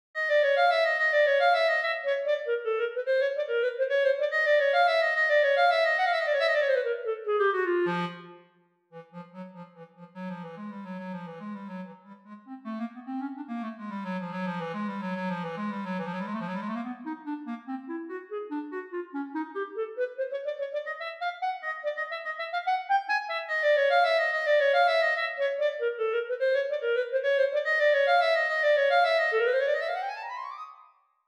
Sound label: Clarinet